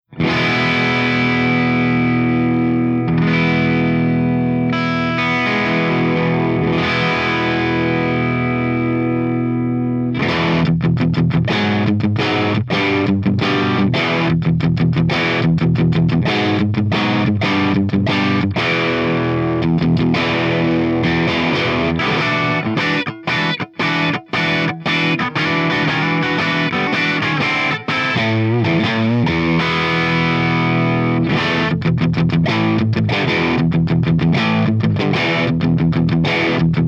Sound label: MAGIC MIC V30 P90